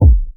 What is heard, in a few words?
clean kick single shot b key